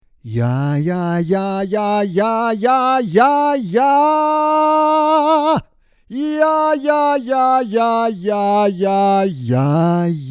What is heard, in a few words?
Saturation High Plate